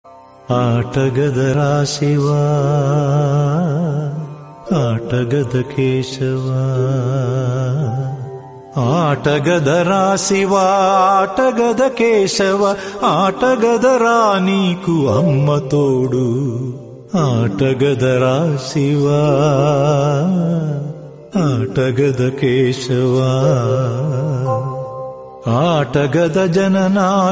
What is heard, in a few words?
shiva devotional song